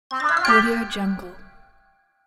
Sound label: cartoon bounce